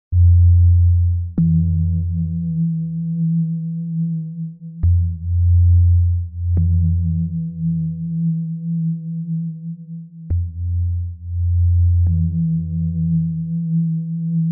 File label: ambient